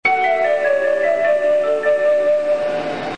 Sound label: bus chime; 国際興業バス; 蕨市コミュニティバス“ぷらっとわらび”